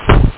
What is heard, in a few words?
tank fire